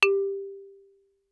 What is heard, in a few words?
Xylophone hard rubber ff G4 stereo; MIS Pitches - 2014; Percussion